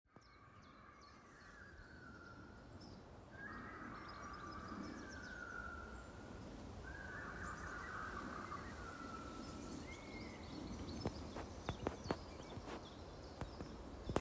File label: Weird noise